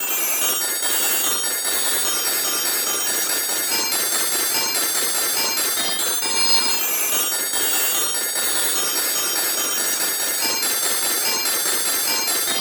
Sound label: telephone